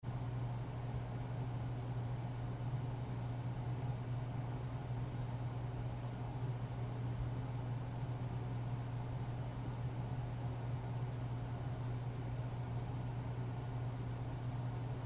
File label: Roomtone Basement Low Ceilings AC Fan; Backgrounds; Big Room Sound